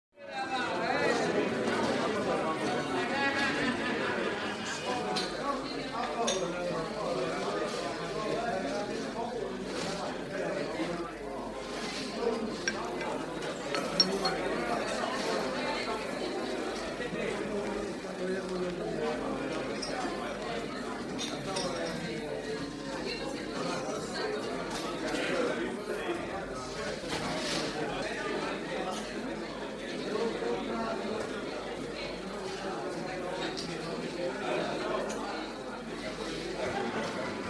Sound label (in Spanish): murmullo voces bar; efectos